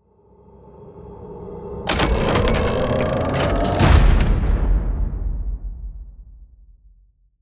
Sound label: promo pad